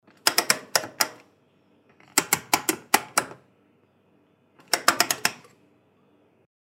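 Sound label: relay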